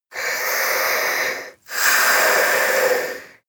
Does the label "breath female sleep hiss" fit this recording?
Yes